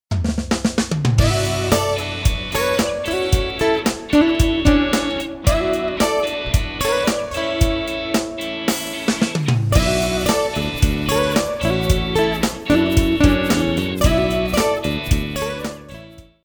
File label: music sample rock